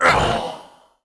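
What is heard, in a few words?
joker damage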